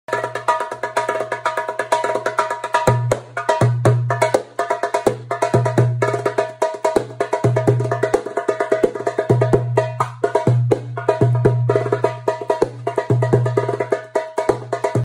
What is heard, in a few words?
Darbuka